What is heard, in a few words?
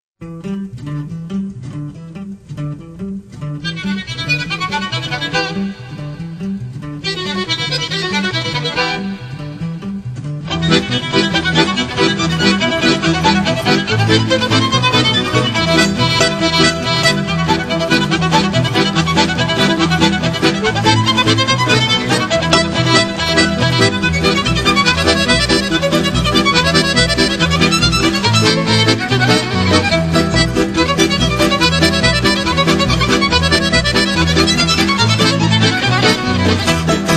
hora spiccato; sharaban